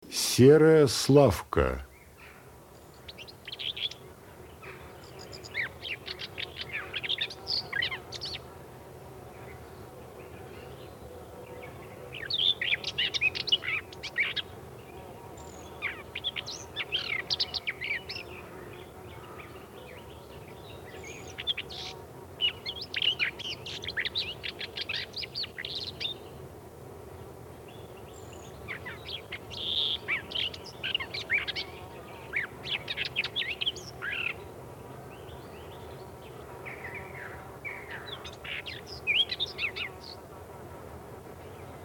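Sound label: seraya slavka voice, common whitethroat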